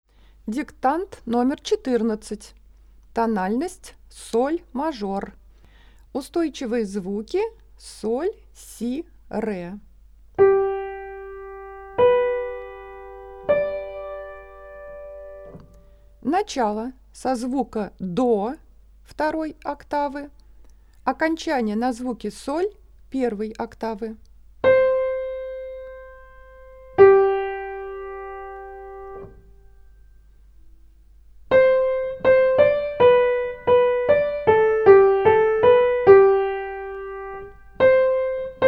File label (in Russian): Соль-мажор